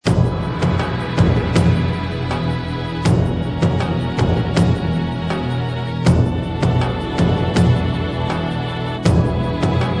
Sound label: drums